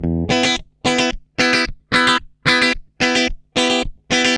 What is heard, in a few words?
110 BPM, Roland; Sound & Vision - Gigapack II CD 1